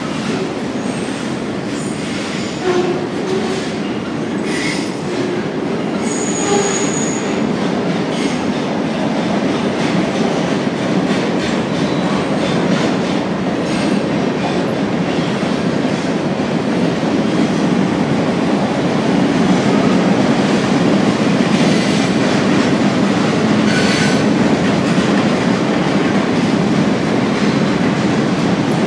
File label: industrial musik